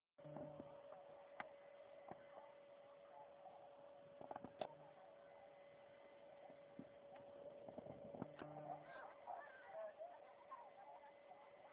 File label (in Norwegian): Bading og strandliv; iogt-junior